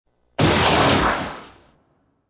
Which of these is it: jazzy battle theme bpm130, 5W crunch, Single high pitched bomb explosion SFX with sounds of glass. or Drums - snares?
Single high pitched bomb explosion SFX with sounds of glass.